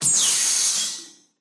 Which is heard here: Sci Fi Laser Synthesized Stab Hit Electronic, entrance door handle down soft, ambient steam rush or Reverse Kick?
ambient steam rush